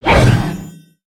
Sorcerer Slash; Valiant; aronwk collection; ndaudio

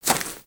Footstep; Lego; Strombie; creatures organic

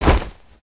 cloth short

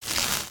Short Shock

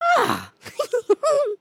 grin, emotes

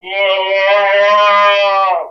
Pet Buffalo Ecstatic; creatures pets; Lego

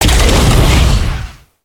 Flame Thrower; creatures organic; Skullken

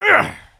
knockdown; creatures organic

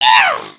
air guitar

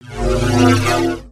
Chevron Tone Short

